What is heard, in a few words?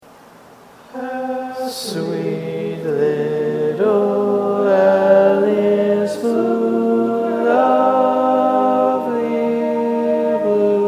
Lead, barbershop